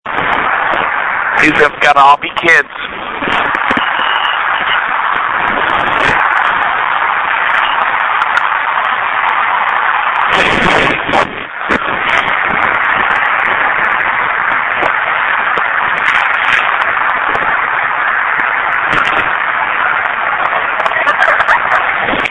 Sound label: girl laughing; EVP